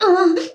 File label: damaged